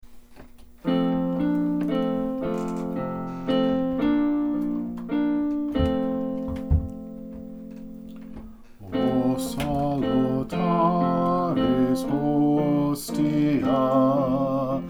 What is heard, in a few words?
O Salutaris Hostia; eucharistic adoration